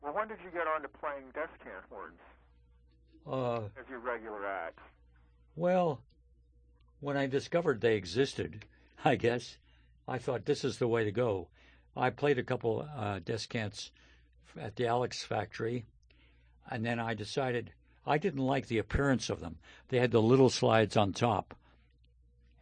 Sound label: playing descants, horn